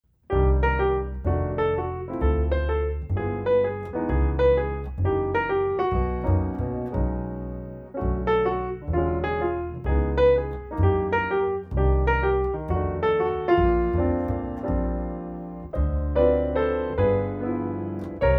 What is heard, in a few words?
waltz